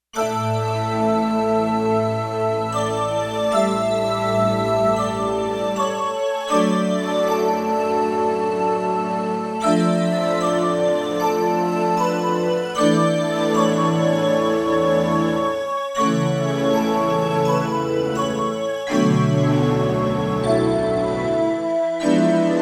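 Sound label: Glock octave; Digital Synthesizer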